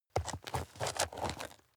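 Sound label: step dirt heavy boots scuff hard, footsteps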